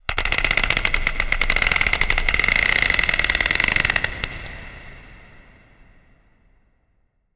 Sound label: Title Transition Chopper; Adobe; Production Element